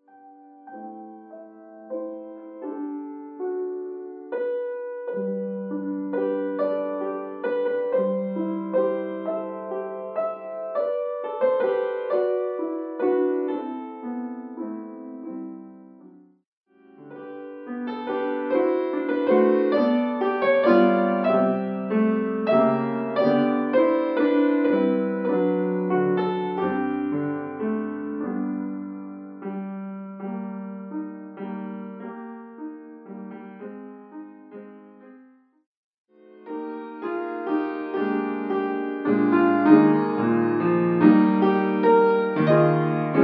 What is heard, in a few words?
Piano; Larice Music